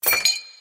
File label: idol pickup; ninjarun